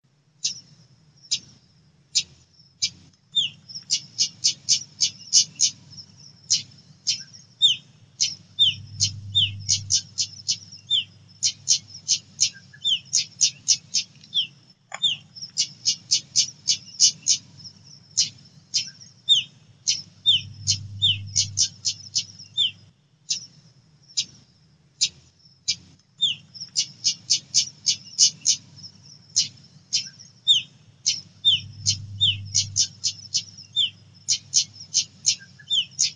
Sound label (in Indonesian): suara decu belang betina